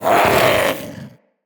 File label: Vocal Attack Short, Hunting Critters; Characters; Vulpaphyla